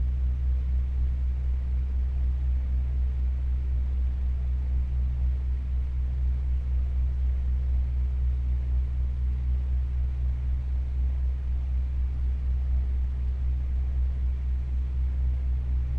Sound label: Electric Fan Operating Noise Loop